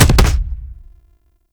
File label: Rocket Launcher Punch; Warframe; Tenno; Lasrian